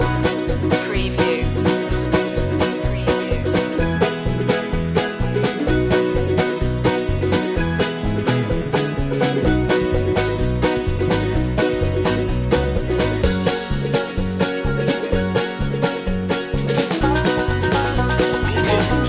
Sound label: cowboy hat loop